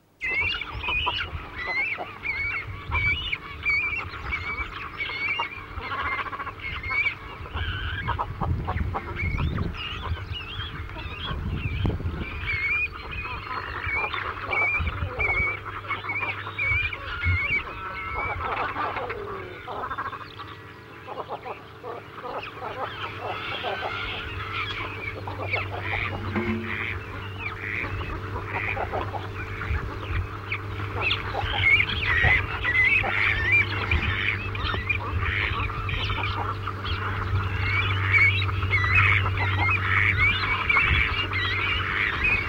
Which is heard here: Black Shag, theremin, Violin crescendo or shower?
Black Shag